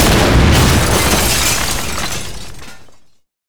super heavy battleship attacked; Hearts of Iron IV Death or Dishonor

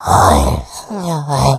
become alert, infected; npc, alert

